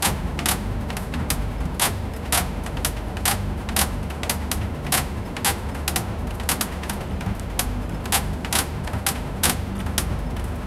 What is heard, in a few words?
old time factory